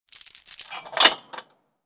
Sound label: Metal chain lock unlocking; gfxsounds